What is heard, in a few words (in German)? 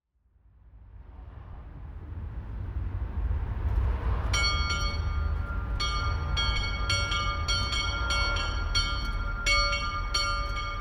Kreuzkapelle Wettingen 12Uhr Glocke; glocken